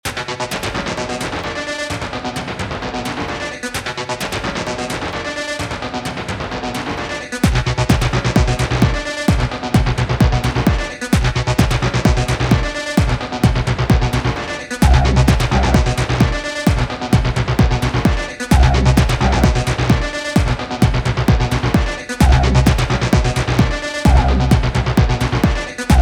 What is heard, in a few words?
endless quick techno 130bpm